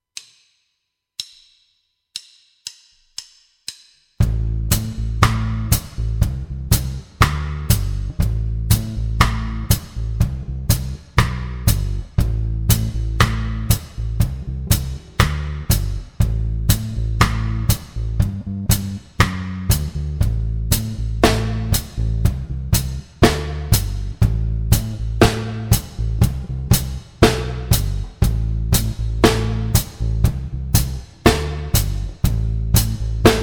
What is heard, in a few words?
ROCKERS (Steppers), Batterie Reggae; Buchmann; Batterie Reggae VIbrations